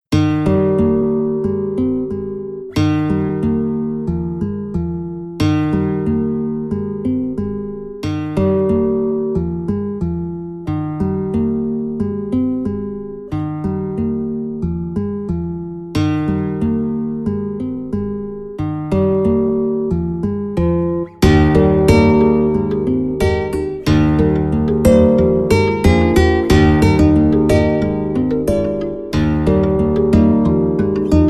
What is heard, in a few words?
hawaiian guitar dusk is coming 432 Hz